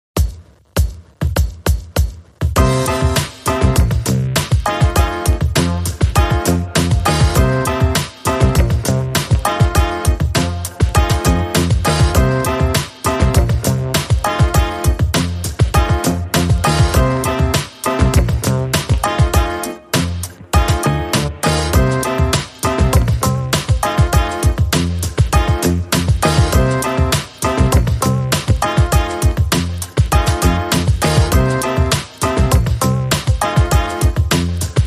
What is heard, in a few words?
No Guitars